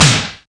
Snare D Key, snare drum, Loudest frequency: 2756Hz; snare drum samples; drum single hits one shots